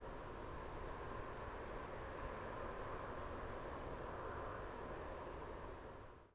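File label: triple, redwing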